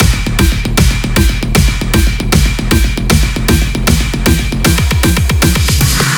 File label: fill loop 155 4 bars; GhostHack